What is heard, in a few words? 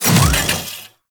Mech Melee Swing Start; Nechro